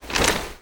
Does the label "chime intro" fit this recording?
No